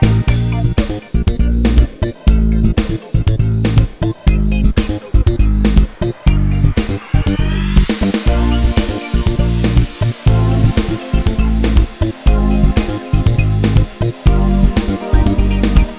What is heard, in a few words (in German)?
MUSI